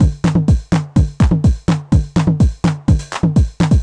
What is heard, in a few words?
drum loop full 125; Sample Magic - Classic Chicago House; Drum Loops